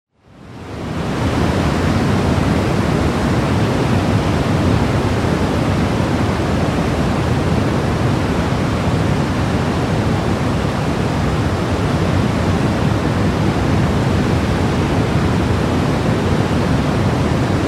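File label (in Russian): звук урагана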